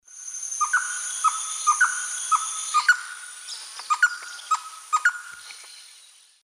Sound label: Campylorhynchus turdinus, alternate song; S. America; Eastern Lowlands